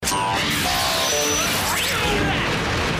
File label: hammer switch on; ringtones